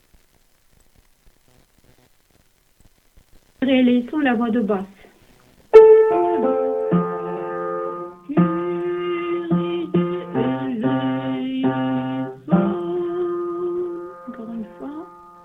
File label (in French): Kyrie basse